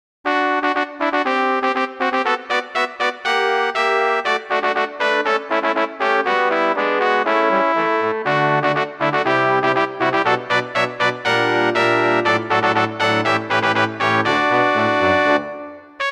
fanfare

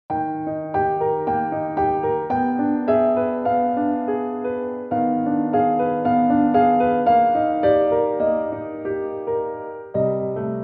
arietta concert; grieg

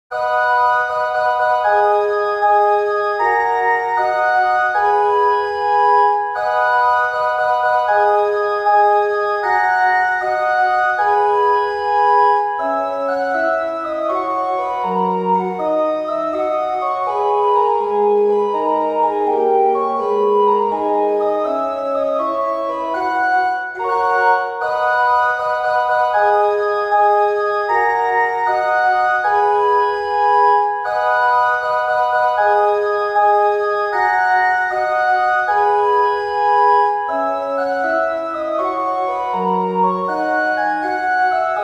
marche organ; tchaikovsky

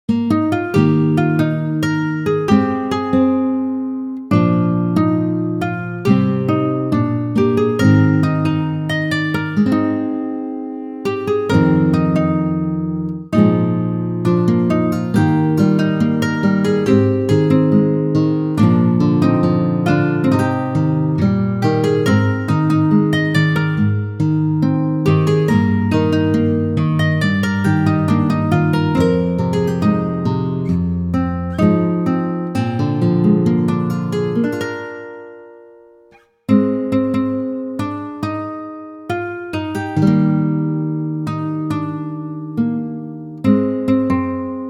fuwari guitar; アコースティックギター音色でクラシカルな雰囲気を表現。

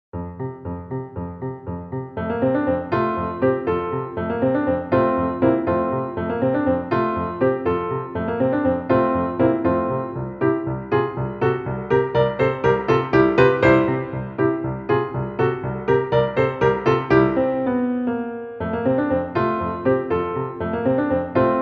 piano; ellmenreich; エルメンライヒ

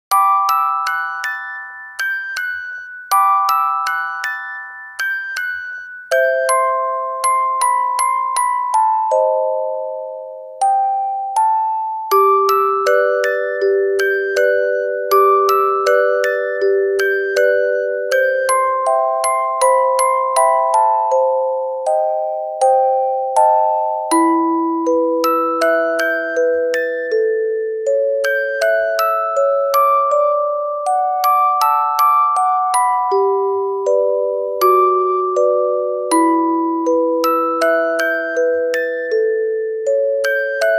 orgel

